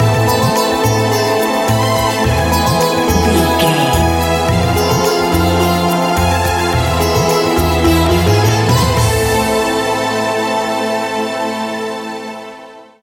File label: Bangladesh music bed, Groovy, powerful, exotic and wild; An exotic and colorful piece of World music